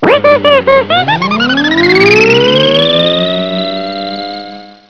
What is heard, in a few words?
flyby